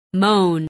moan

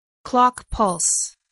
clock pulse

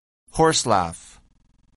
horselaugh

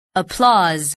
applause

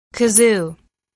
kazoo

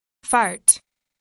fart

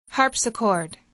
harpsichord

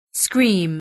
scream

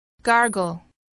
gargle

